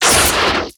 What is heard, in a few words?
attack; storm spirit